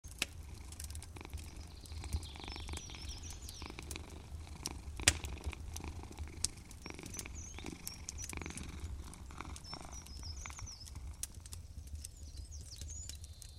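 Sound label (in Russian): zvuk kamina i murchanie kota, fireplace and cat purring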